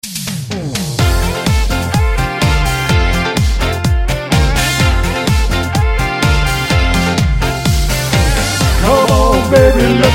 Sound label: harmony sample